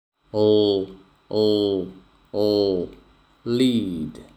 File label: lead